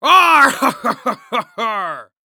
Human Pirate Laugh

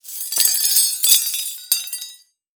Industry Tools Drop On Floor Many

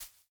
Sports Baseball Dropping On Grass

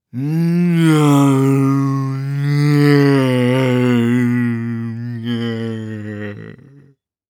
Horror Scary Undead Moan

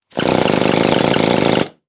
Industry Tool Run Air Chisel

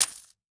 Impact Coins In Plastic Bag Dropping On Cement; Impacts

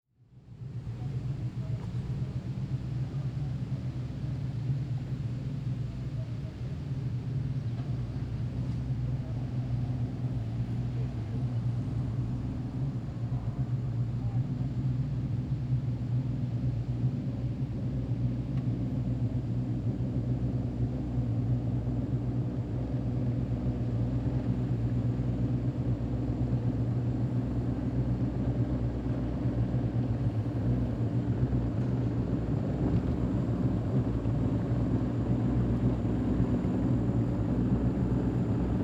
Jet Ski Idle In Canal; transportation

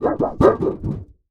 Cartoon Metal Sheet Cartoon Wobble; AdobeCC

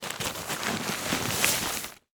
Sports Tent Pulling Tent From Plastic Bag

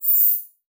Multimedia Internet CD-Rom Flash Short

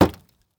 Weather Snowball Hitting Car; AdobeCC

